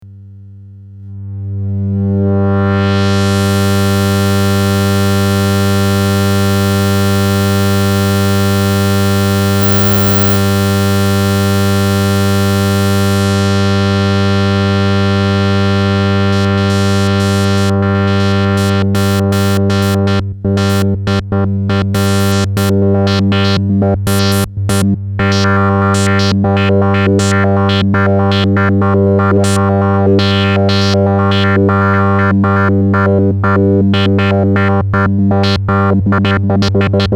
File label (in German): Filter Sample Hold Voice Modul; DSP